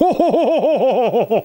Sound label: Best Laugh Ever